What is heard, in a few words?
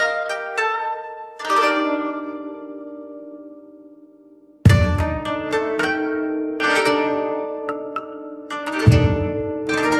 musicgen japanese traditional